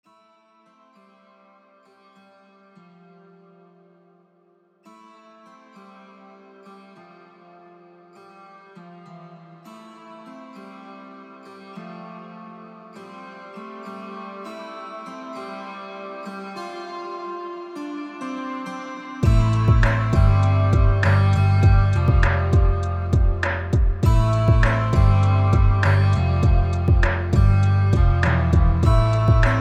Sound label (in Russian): гитарка; бесконечное лето